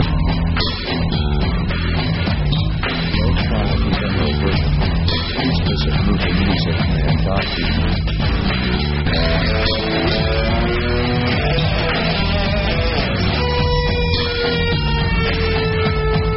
rock me, slow

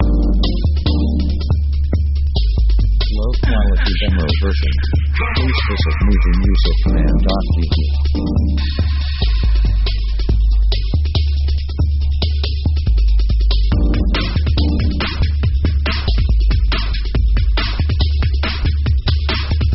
jungle house sketch

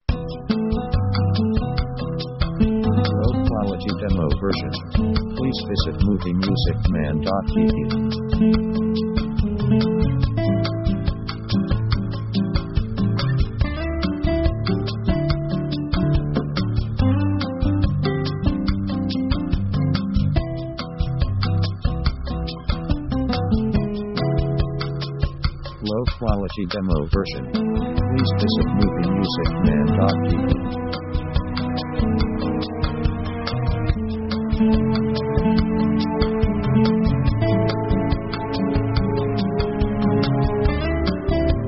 Latin Bossa

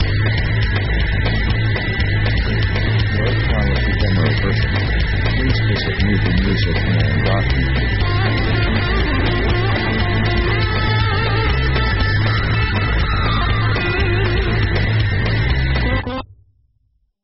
metal

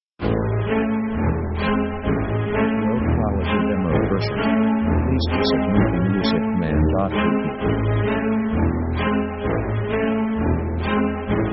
quirky comedy

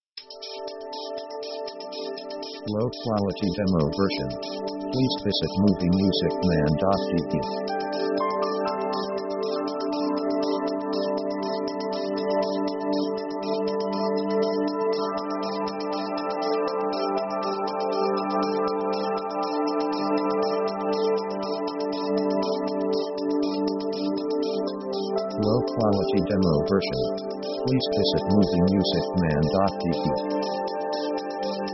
discreet background light; synth themes

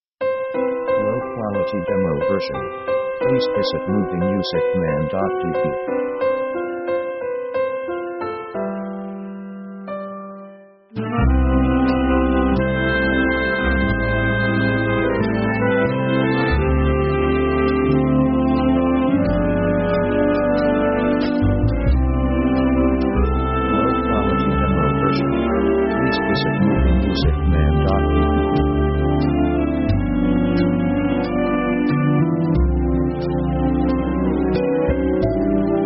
orchestra american someday

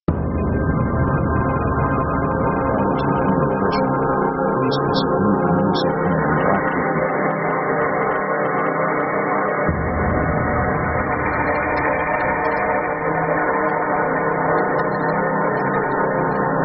dark city panorama; synth themes

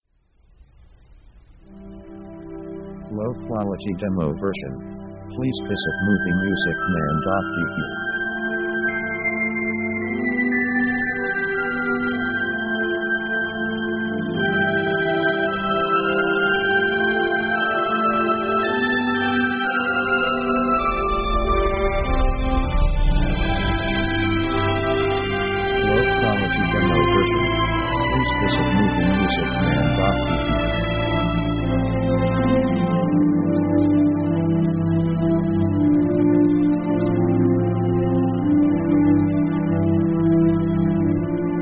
HOLLYWOOD HAPPY END, orchestra

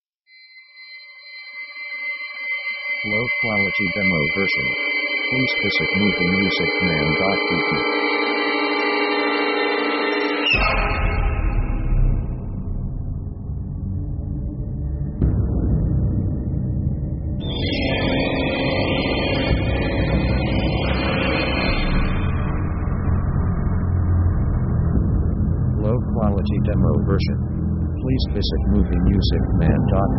slow horror with strike; Dark mood

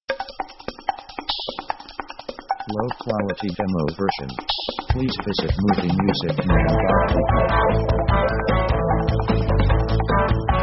rock funny

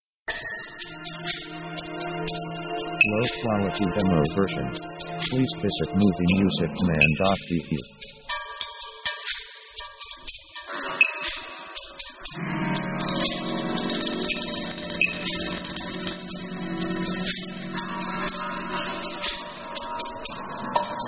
orchestra spooky chords; orchestra themes

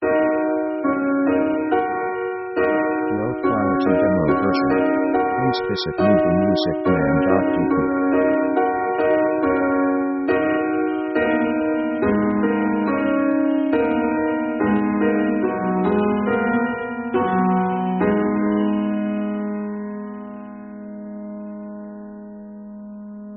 piano china scetch; ethnic themes

